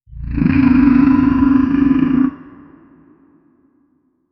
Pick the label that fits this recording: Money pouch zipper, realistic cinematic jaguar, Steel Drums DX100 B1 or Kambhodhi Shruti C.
realistic cinematic jaguar